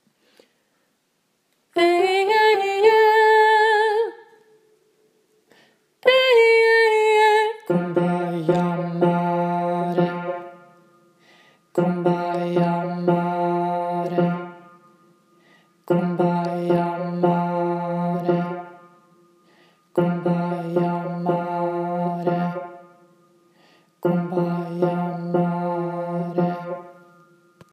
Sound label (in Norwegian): bass; jeg-vil-love-deg-slutten; åfjord